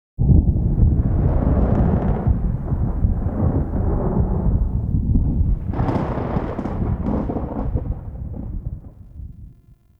Exterior Rolling Thunder; Ambience; Duviri

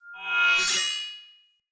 Short Sword Whoosh Heavy Accent; Weapons; Player

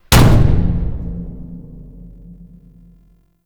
Mech Jump Slam Impact; Vehicles; Abilities; Warframe; Nechro Mech

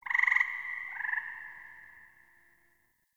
Forest Distant Frog Call Resp; Warframe; Grineer

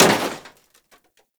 Scooter Impact; Warframe; Ambience; Nineteen Ninety Nine

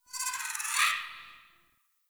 Tree Critters Angry; Ambience; Props; Warframe; Duviri

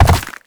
land ice; Movement; Warframe; Uriel

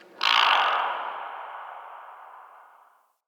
Swamp Pelican, Ambience; Midrath Island

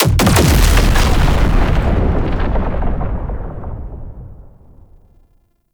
Dropship Explosion; Warframe; Tau; Prime Grineer

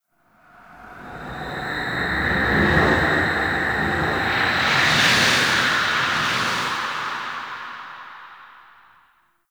Exterior Empyrean Gust, Ambience; Warframe; Railjack

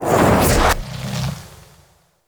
Stun Ability Cast; Warframe; Helminth Abilities

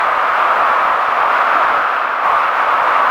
Void Dragon Boost Loop; Enemies; Gameplay; Warframe; Duviri

